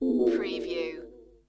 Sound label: Space Machine